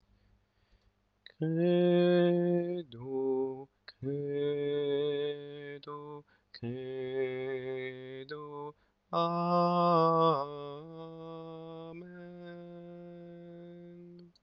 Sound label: credo alto; Lourdes; mariage